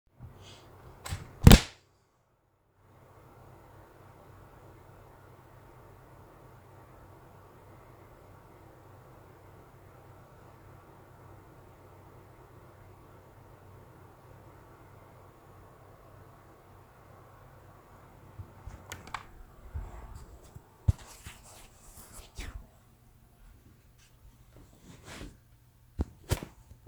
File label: Open tablet vlosed